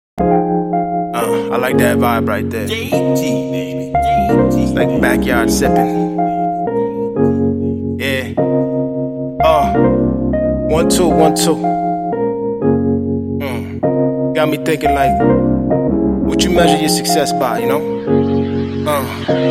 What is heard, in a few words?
Freestyle